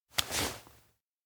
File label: pull hand; awayuki; anemoi